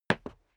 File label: step sneaker concrete single stair down; schritte